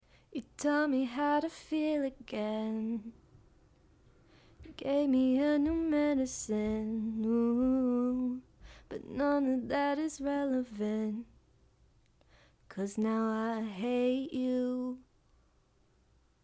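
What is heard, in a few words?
pop, 117, B minor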